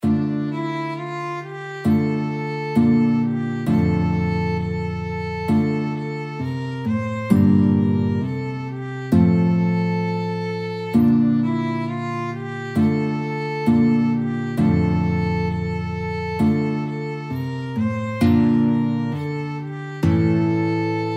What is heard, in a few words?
GUIT VLN, violin guitar duet